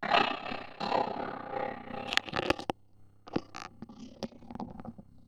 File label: Ring Mod, Cymbal, contact mic; Murf & Ring Mod